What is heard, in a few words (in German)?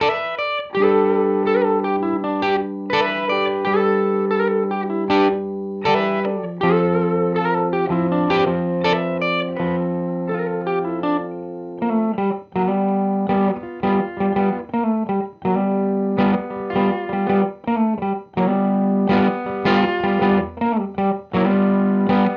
Viel Gain Room; Walrus Audio ACS1 Fullerton